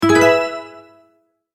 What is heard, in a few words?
multimedia tone advance